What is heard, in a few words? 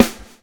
swing